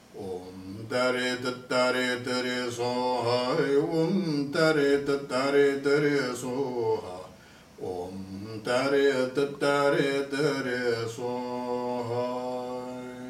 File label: green tara mantra